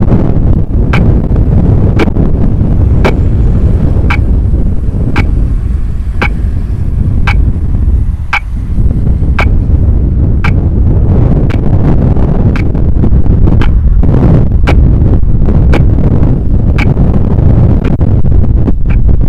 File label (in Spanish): sonidos semaforo viento autos